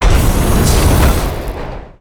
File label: library gate deth, monster